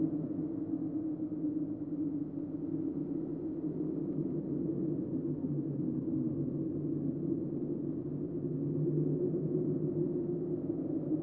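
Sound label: magic dire loop, ambient soundscapes; dota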